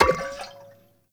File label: Mech Oil Grenade Bounce; Warframe; Nechro Mech; Abilities